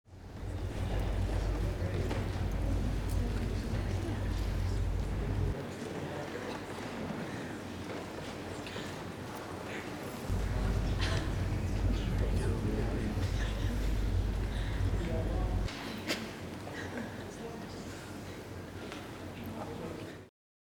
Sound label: low cut filter